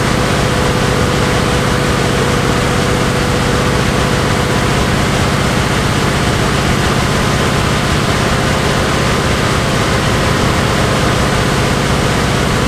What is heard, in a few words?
sherman m4a1 engine rpm 99, tanks; engines; War Thunder